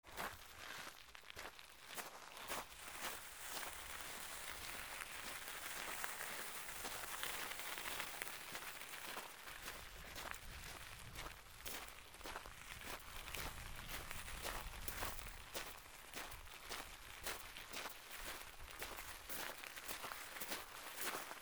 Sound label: FOOTFALL